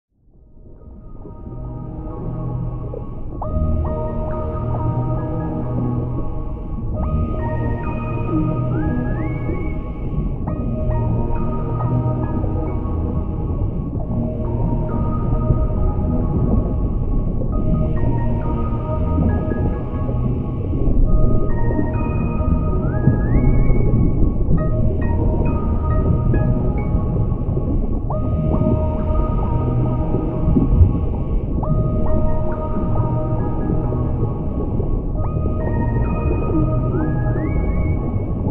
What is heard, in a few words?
Subterranean Theme with Underwater Ambience; Torvus Bog